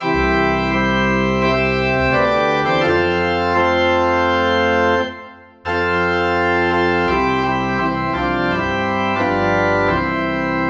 Organ in C

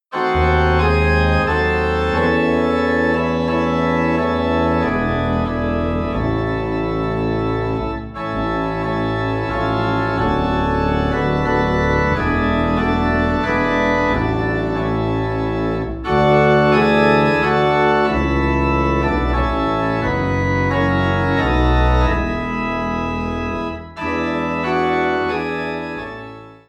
Organ Low in A

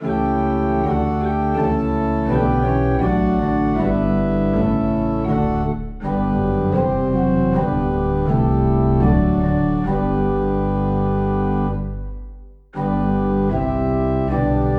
Organ Low in G; Oh Sacred Head Now Wounded

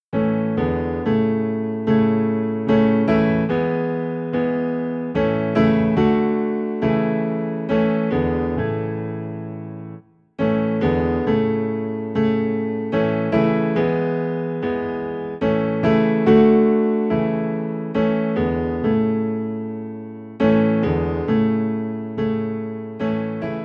Piano, Low in A; Come Thou Fount

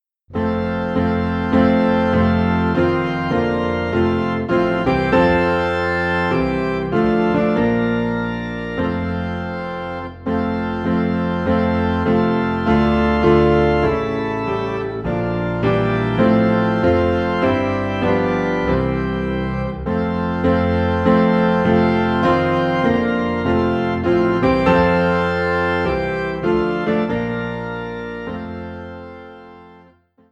Piano and Organ Low in F; Hallelujah What a Savior

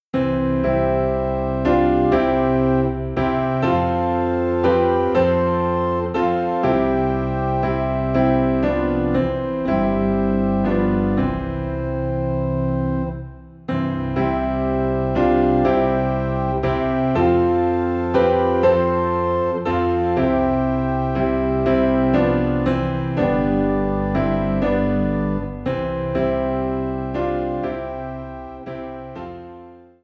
Piano and Organ in C; Sweet Hour of Prayer

